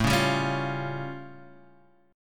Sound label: A Augmented 7th A, Guitar Drop D; chord